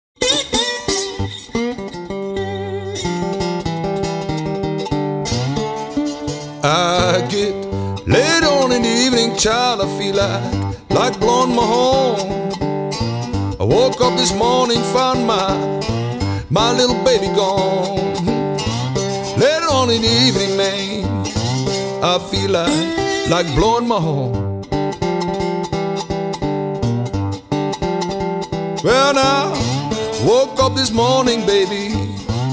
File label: Country Blues